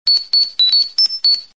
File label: cute sms